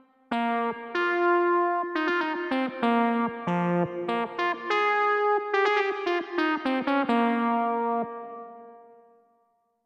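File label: Vox Lead, digital piano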